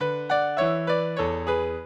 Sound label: minuet, mozart